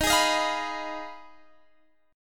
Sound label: F Minor 7th Flat 5th Eb, guitar chord